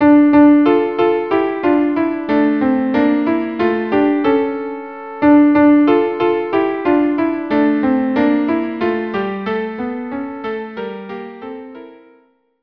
violin viola duo; God rest ye

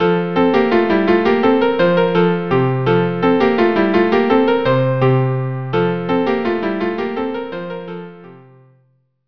branles

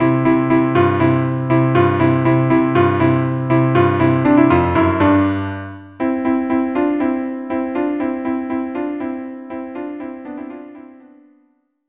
cello duo

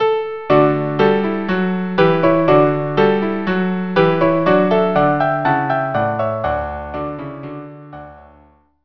bach marche

